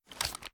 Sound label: raise first start, weapons; gmod; viper